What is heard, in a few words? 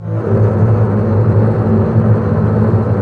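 double bass tremolo; Roland L-CD702; Cbs FX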